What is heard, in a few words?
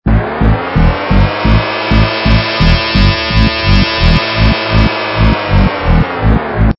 Stinger; STINGERS